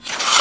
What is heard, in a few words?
gurp; quake2; walker